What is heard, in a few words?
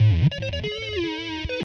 140bpm 303 slide